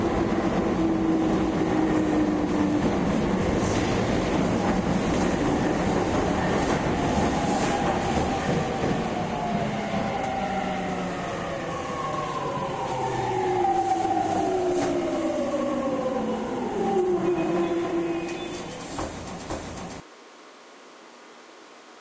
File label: E231 sub slow stop; tetsuon